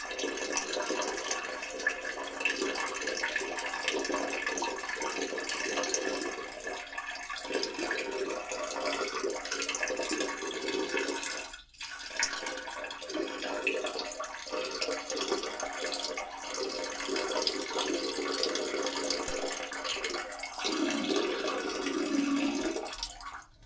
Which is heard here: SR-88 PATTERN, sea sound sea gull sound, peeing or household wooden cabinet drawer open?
peeing